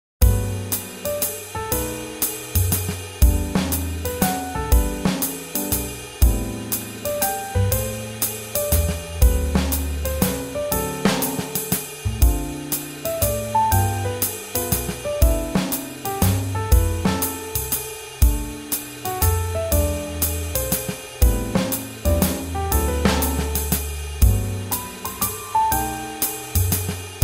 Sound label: Swing Jazz